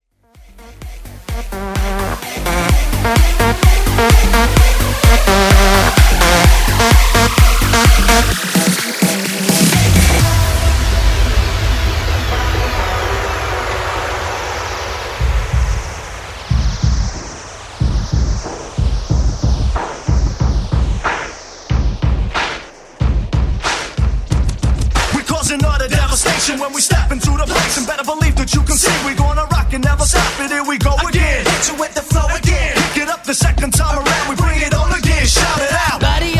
EDM Bounce Mashup

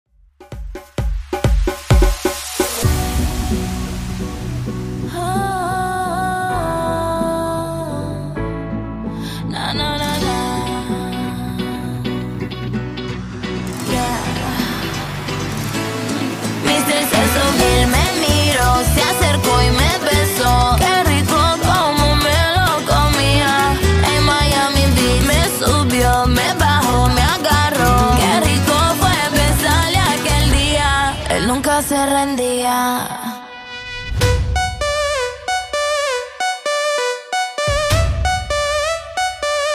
saxo afro

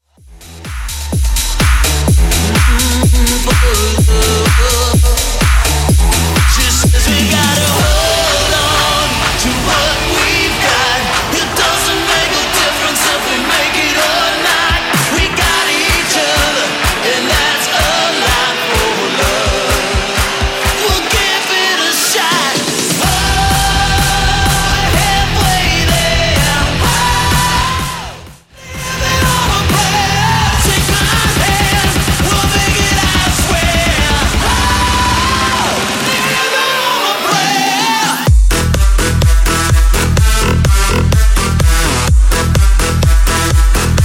Bounce Drop 126bpm

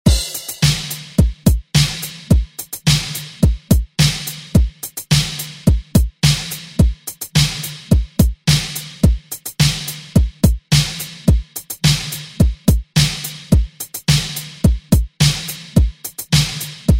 80s Re Drum; DJ Mhark

